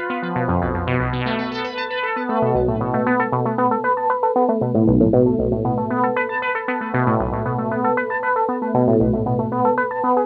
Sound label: Jazz